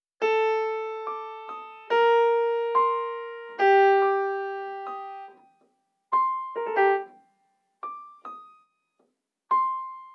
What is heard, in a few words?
142 BPM G Min Piano, Drill; Melody; Loop Stems; Cymatics; 2022 Melody Collection; Pagan